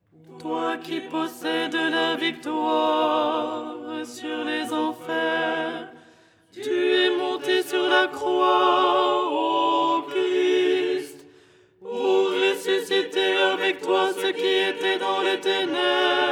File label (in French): alto; Au lucernaire, 1ère stichère du dimanche; Les 8 tons en français; ton 6